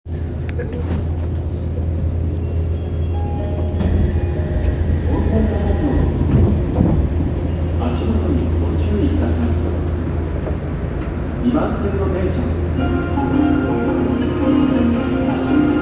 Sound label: train sounds; 東西線門前仲町西